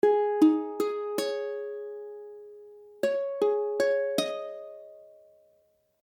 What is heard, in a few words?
Cissus2, ukulele; ukulele akordy; C#sus2